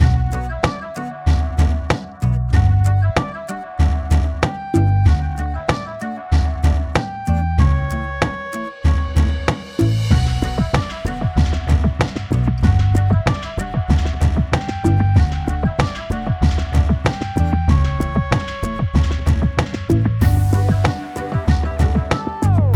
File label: Drummin; Drawn to Life Two Realms